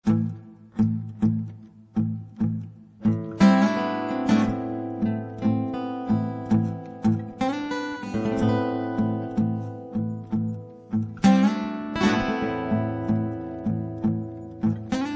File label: Blues